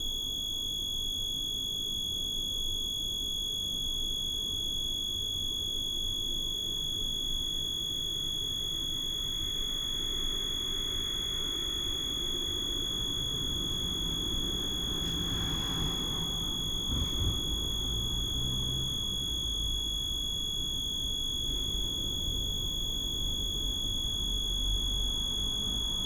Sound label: buzz vrsovice